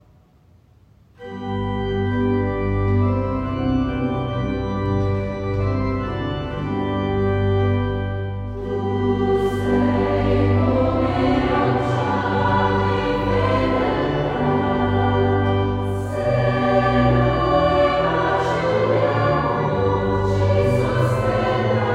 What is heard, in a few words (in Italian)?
3v e organo coro